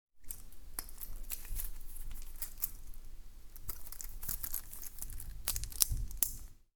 Dressing A Wrist Watch

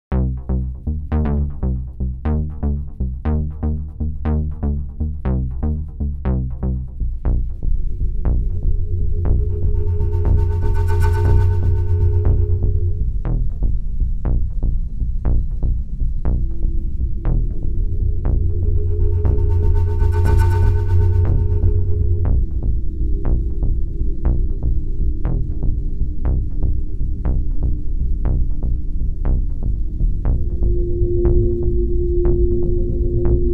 Dark Action Thriller Atmo

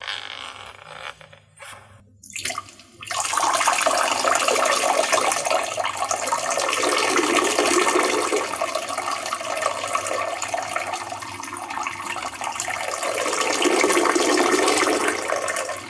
Pee

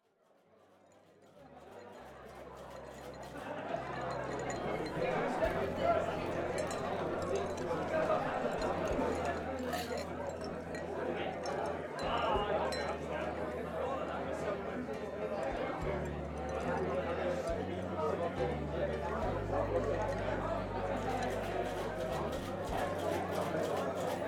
Bar Scene

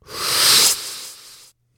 Small Short Sucking Whoosh

